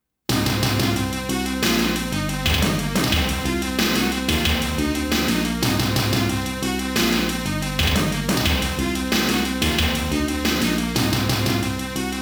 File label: Fight Uninjured; Art of Fighting Original Sound Trax